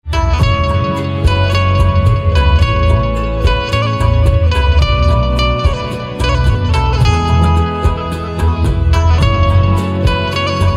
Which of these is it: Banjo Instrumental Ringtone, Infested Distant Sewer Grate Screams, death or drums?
Banjo Instrumental Ringtone